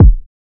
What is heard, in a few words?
kick